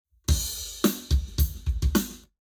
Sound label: Drums, 90deg; eBreve; Lab Wet